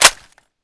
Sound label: lr300 mag in, weapons